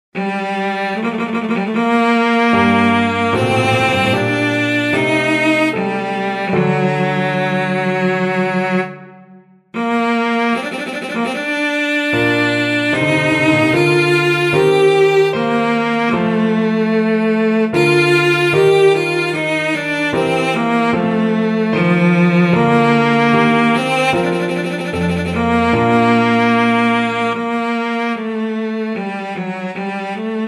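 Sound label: violoncello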